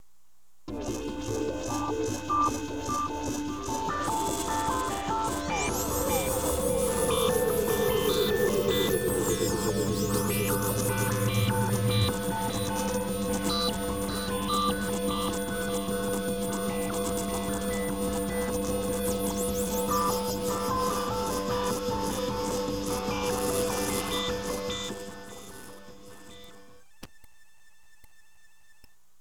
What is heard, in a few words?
Intro Pad